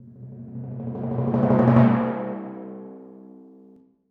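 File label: timpani roll crescendo c2; Percussion; Sonatina Symphonic Orchestra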